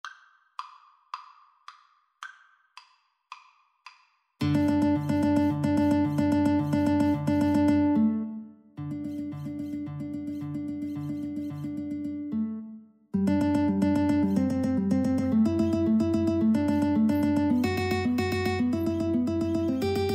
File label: Guitar Duet